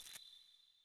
Switch to Ice Eggs; Banjo Tooie

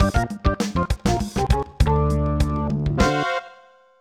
Sky Diving - Landed Outside Target; Pilotwings 64

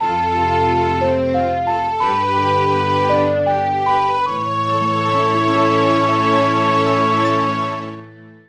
Ocarina; Legend of Zelda, The - Majoras Mask

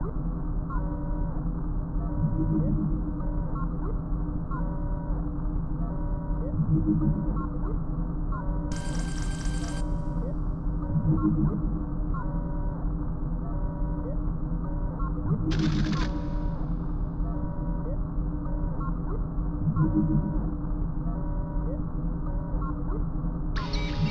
deep sea; Perfect Dark

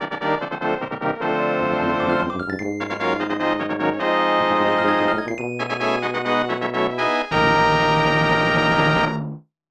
Great Victory, Super Nintendo; Legend of Zelda, The - A Link to the Past

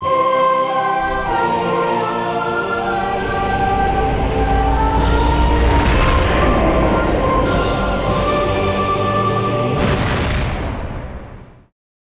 End Jingle; Metroid Prime

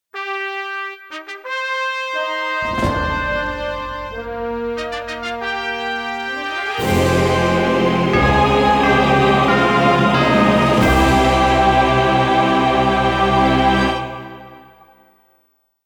defeated fanfare; King Bulblin And Lord Bullbo; Legend of Zelda, The : Twilight Princess

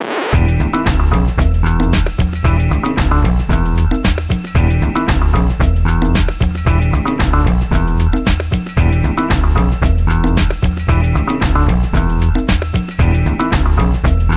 Funky Disco; Nintendo 64; Jet Force Gemini

